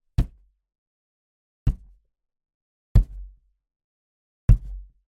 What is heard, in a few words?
impact wood panel hits x4